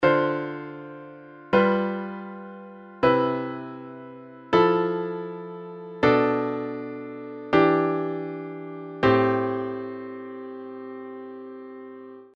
II V I three voices diatonic circle, jazz chord progressions